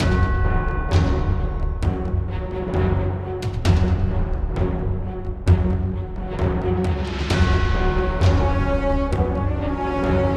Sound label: Music Autumn Threat Med